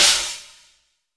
Brush Tap long, snares

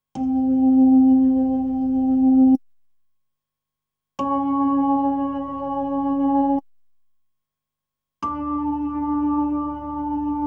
B3 Organ; Masterbits CLIMAX 8

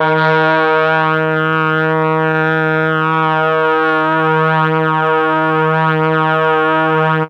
MEL BRASS; ILIO; Propeller Island; Legendary M400

